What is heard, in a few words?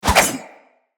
Pole Arm Spin Whoosh; Weapons